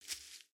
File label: Foot Grass Walk, footsteps